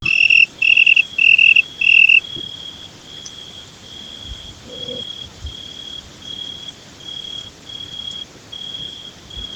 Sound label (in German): Weinhähnchen